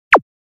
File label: E-Tom, e-drums